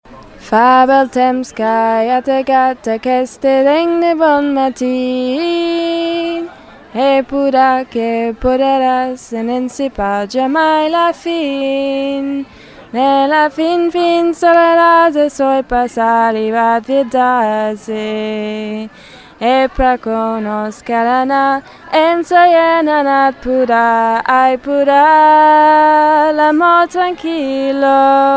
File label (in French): basse; Lospodaires